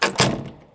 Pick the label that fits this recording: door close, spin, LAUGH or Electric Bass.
door close